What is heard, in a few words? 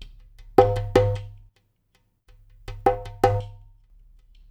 EPH DUMBEK; Spectrasonics; Liquid Grooves 1 & 2